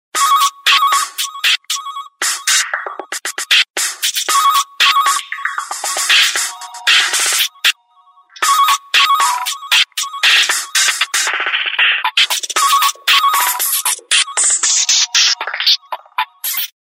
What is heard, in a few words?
Business Tone; Samsung SC-01B